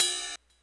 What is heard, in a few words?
ride, Hardcore Breakbeat; Big Fish Audio; Hardcore Breakbeat Action